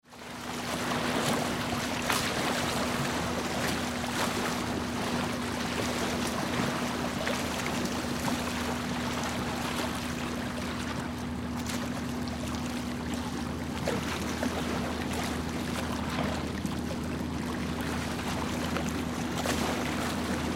Lake Shore Boat Engine Waves Crash; Lake Shore Boat Engine Small Waves; Nature; Ambience; Blastwave

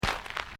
FOOTSTEPS SNEAKERS GRAVEL FINE STEP; Footstep Foley; Footsteps (Sound Effects:Human)

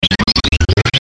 Chopper Digital Distortion Dip; Blastwave; Chopper Sound Effects

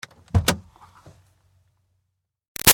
Car Highlander Door Interior Open; 2005 Toyota Highlander V6; BigRoomSound

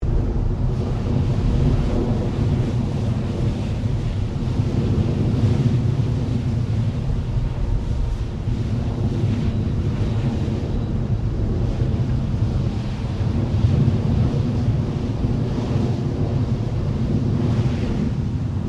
REFINERY GAS BURNOFF FLAME LOOP; Machinery (Sound Effects:Machines)

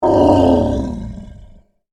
Monster Groan, Horror, Monster, Groan, Creature; Monsters (Sound Effects:Horror); BlastWave

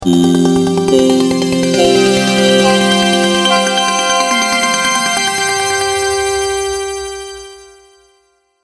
Audio Logo Amazing, sonic logo

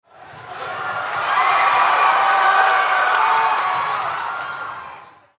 Crowd Arena Cheer, Crowd Arena Big Cheer; Crowds (Sound Effects:Backgrounds); SPORTS; SoundEffects-Blastwave

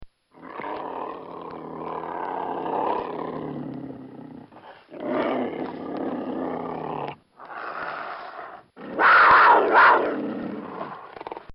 Couigar Snarl

